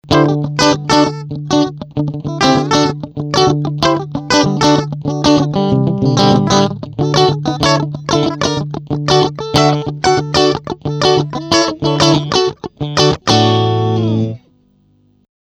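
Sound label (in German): Mittel und Stegpickup Clean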